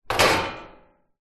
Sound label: household metal baking tray put into dishwasher